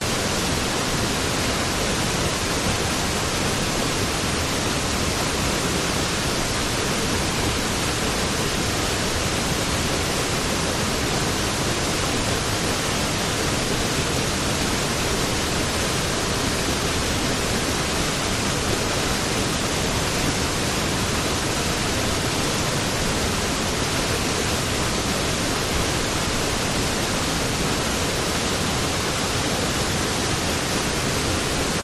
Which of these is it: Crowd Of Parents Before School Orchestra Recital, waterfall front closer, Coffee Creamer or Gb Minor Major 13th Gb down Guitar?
waterfall front closer